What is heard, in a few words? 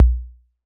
CR-78 Kick; Roland BK-5